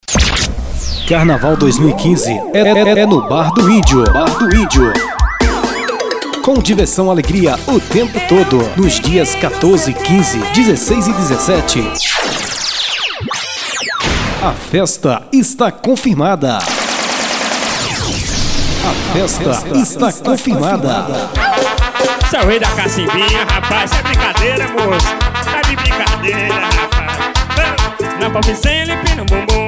carnaval

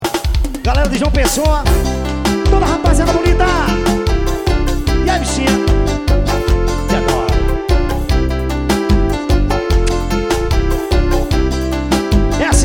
forró ao vivo

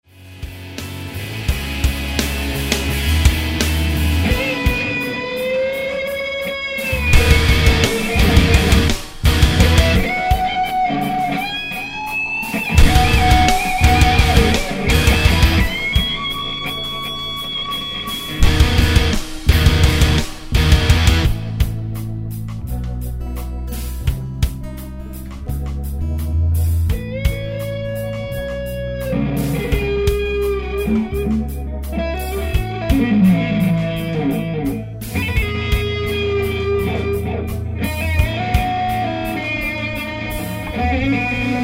solo

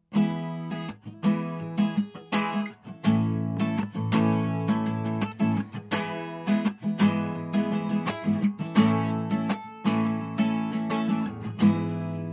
confidente acústico, sertanejo universitário

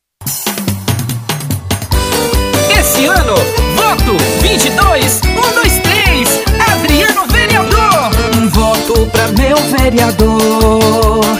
jingle fogueira

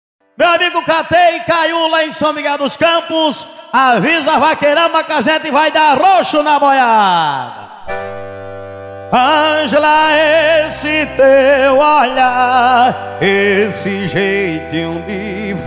viola

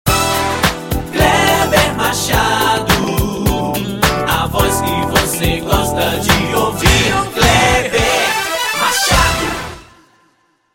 jingles vinheta cantada

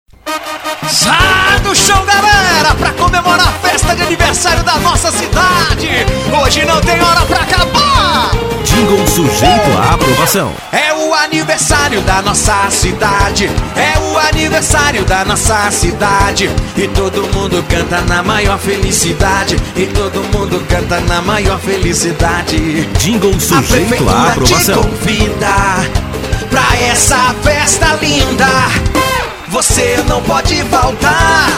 jingles politico

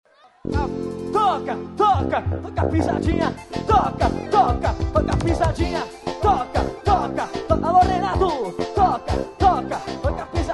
forro pisadinha

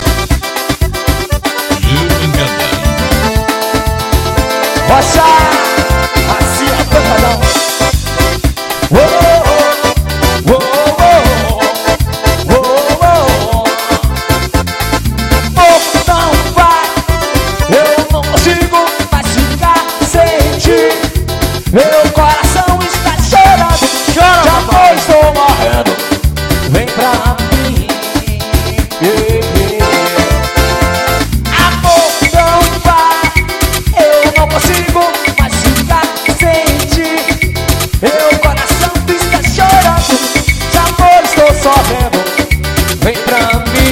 forró ao vivo; ao vivo em perus

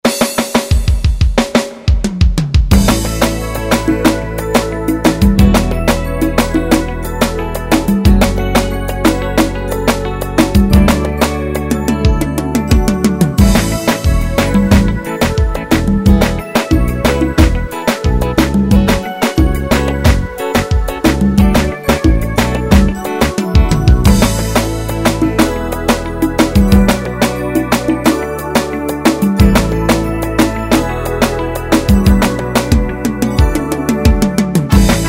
ritmos yamaha pisadinha break

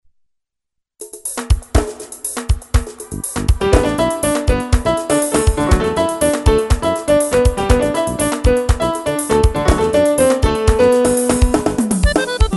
ritmos vaneirao sertanejo

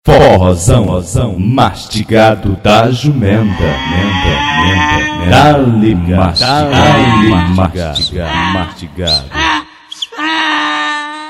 vinheta

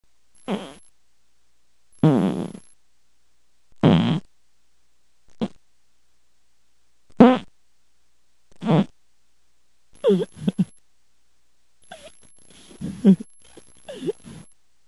peidim concentrado

